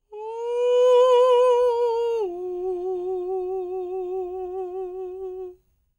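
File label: falsetto, Vocal Planet Jazz FX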